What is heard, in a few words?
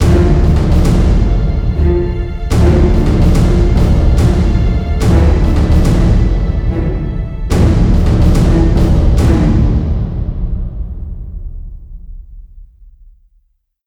Success